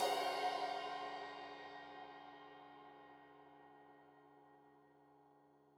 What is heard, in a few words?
Crash 15in L edge, Cymbals Stereo